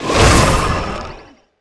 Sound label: body force jump